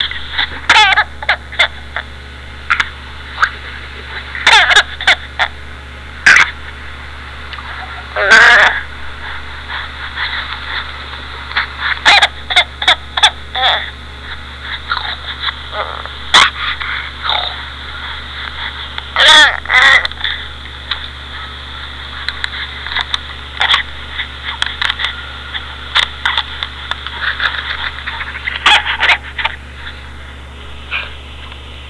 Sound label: giggling